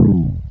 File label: ice, VIDEO GAMES; mario